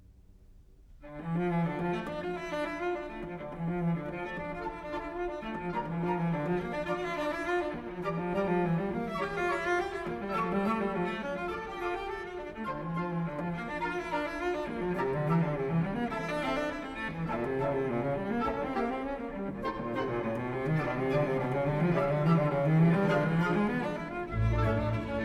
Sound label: Suite for Cello and Orchestra